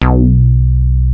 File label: Moog Bass Hit